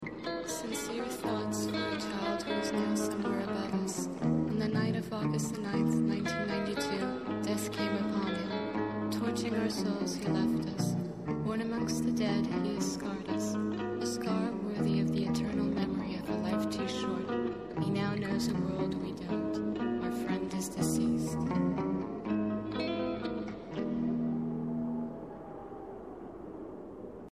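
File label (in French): electric guitar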